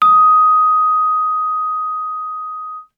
Vibraphone short sustain ff Eb6 stereo; MIS Pitches - 2014; Percussion

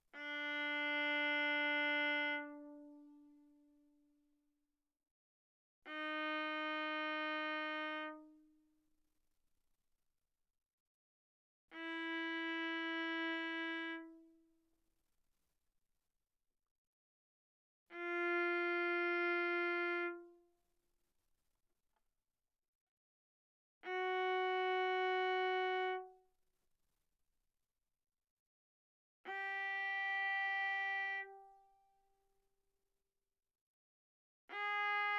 Viola arco sul D ff D4 B4

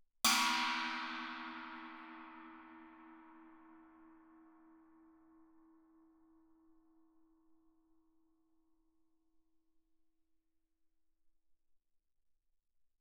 chinese stick normal ff, suspended cymbals; Percussion

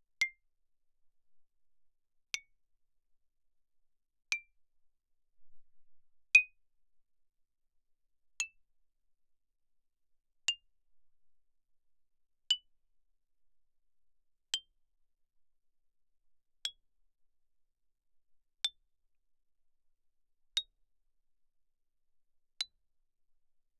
xylophone rosewood ff C7 B7; Percussion

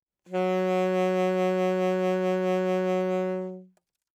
Alto Sax vib ff Gb3, Eb Alto Saxophone; Woodwinds; MIS Pitches - 2014

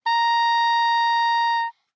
Oboe ff Bb5 stereo; Woodwinds

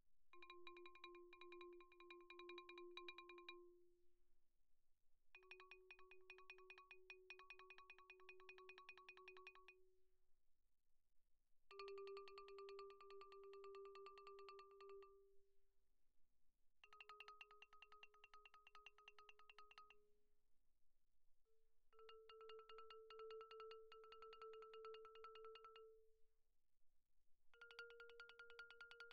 xylophone rosewood roll pp F4 B4; Percussion

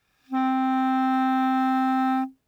Bb Clarinet ff C4 stereo; Woodwinds

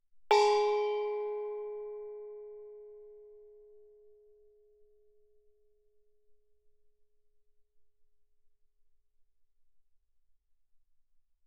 thai gong Ab4 ff; thaigongs

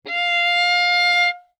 Violin arco ff sul D F5; MIS Pitches - 2014; Strings

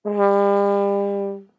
Tuba ff G3; Brass

